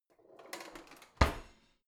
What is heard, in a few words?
old drawer creaky in